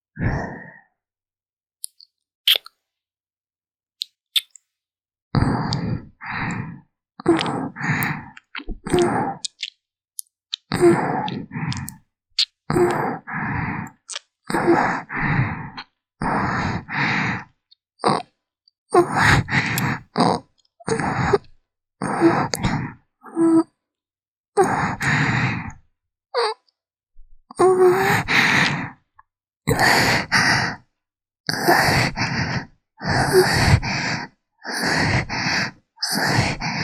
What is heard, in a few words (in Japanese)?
感じるキス